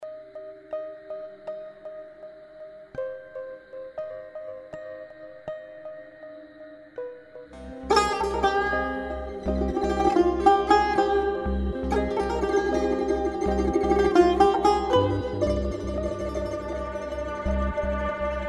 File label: Sound Track